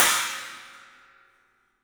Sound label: CYMBALS; 3D Sound; 3D Drums